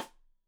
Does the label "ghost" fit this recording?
No